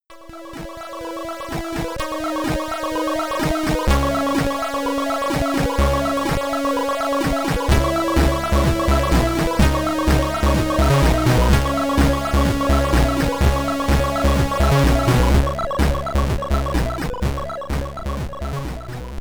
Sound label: Get Ready, SID Stereo; Cyberdyne Warrior; Commodore 64